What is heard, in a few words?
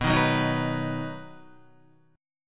B Major, Guitar, 4F,4A,5C,5G,5C,6E; strummed; JGuitar